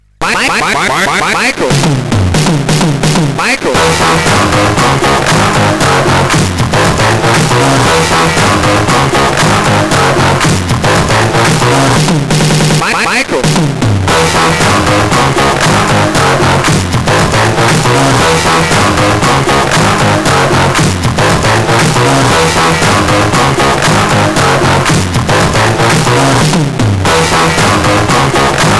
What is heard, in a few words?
Old Micro Digi, sid CSG8580R5